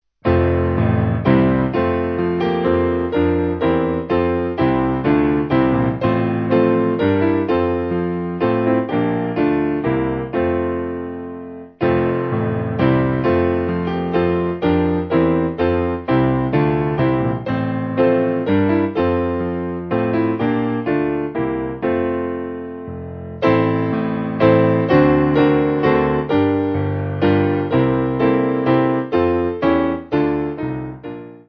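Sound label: Piano, backing; Hyfrydol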